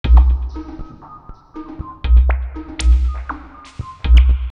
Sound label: Axiom 23 120, drumloops DrumMachines; X-Static Goldmine